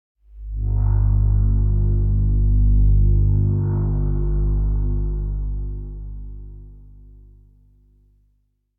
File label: Bass Horn Reminder - Low; Hollywood Edge; sound designer's toolkit v2